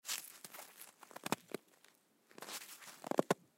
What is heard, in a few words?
Snowball Make Grab Snow Form Snowball; Snowball Make Grab Snow Form Snowball Shape Rub With Hands; Ice (Sound Effects:Weather); Blastwave